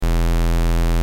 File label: simon buzz; Simon Game